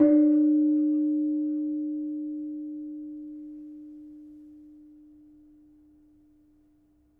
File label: Kenong, gamelan; gravityharps; timbrebox